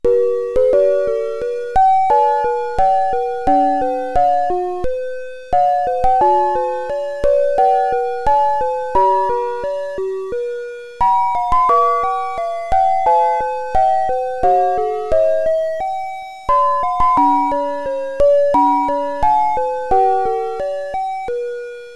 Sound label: sine tri; mymelo